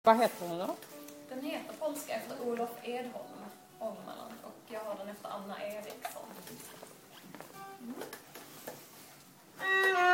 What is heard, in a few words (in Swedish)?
Polska; efter Olof Edholm